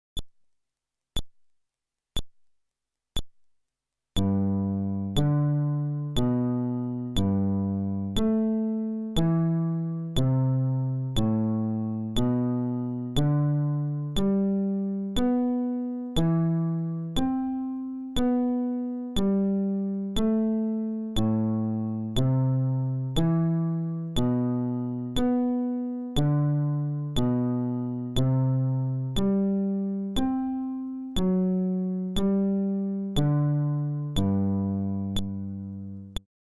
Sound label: Arpeggio, fretless bass